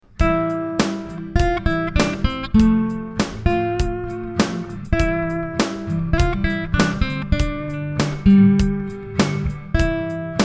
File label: reverb room